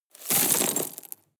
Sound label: drop rock debris hard short